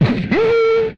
Ker Ching; retro arcade; MMRetroArcadeSoundsPack

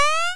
hurt; Free SFX for anything

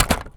Rattle; BB Retail Therapy Sample Pack

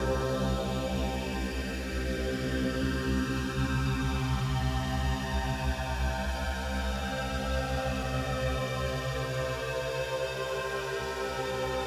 Retro Ambience Short

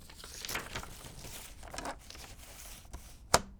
Paper Flutter; Micro Pack; Paper Cutter

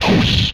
Swipe; retro arcade; MMRetroArcadeSoundsPack

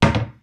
door close

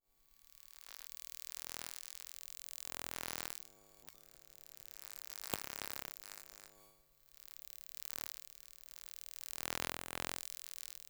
Electromagnetic Keyboard Charger Sequence; Mono; Essentials Series NOX SOUND

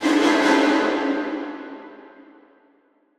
Stabs Strings High, horror strings

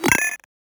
Computer Break; FREE SFX PACK VOL 2

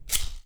motor start

